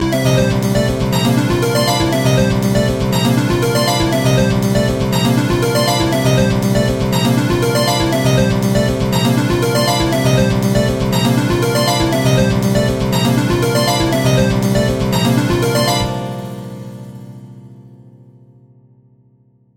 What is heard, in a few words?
DJ Sample